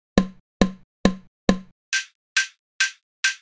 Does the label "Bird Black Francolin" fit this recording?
No